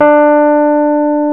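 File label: piano Rhodes Mk.V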